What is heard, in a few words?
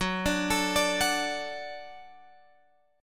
F sharp Minor Sharp 5th, Guitar, Drop D